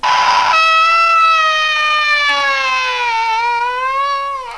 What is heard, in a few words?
death